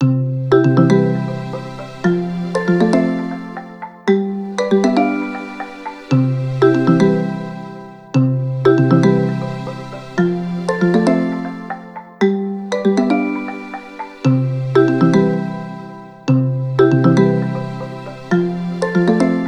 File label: Morning Forest, ringtones; Honor; Power